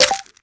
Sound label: Splash Object egg hit; Jammer